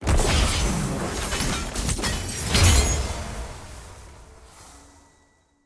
tier wings promotion; ranked; gold

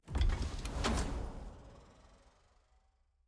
sfx ui collection record open click; caitlyn

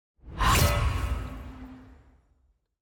perks lockin primary

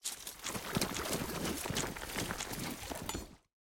bowl stir

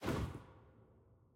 whoosh back

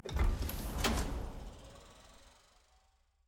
sfx ui collection record open click; caitlyn

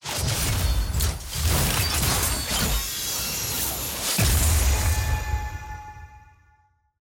regalia tier promotion bronze to silver; leagues

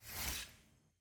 missions transition animation

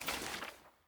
sfx ui record next click

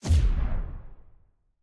home click

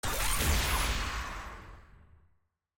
ui map click; pyke

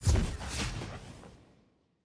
member banner intro; parties

